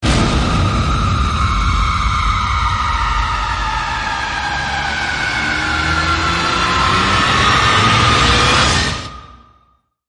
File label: First Strike Rise; Ninja Tracks